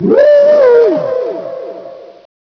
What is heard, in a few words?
weird howl